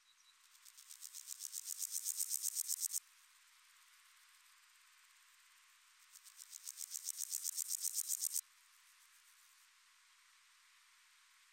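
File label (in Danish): enggræshoppe, Pseudochorthippus parallelus; I varmt og solrigt vejr; Insekter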